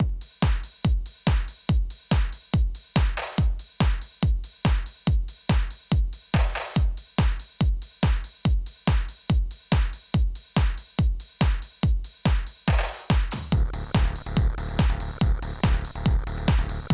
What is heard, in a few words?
Generic Trance